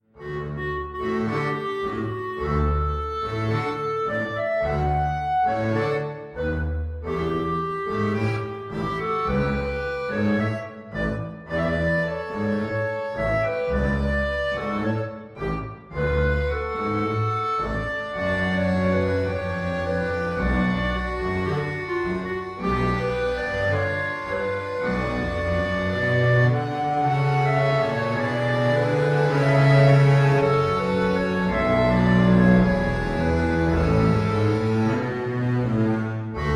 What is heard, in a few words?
Tango in D Trio Computer Sample